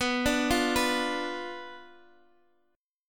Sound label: B Diminished B down, guitar; Bdim arpeggiated